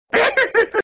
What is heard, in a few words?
laughing; star wars